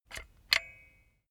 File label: silicone gun bullet lock mechanism regular; berufe; handwerker; soundpool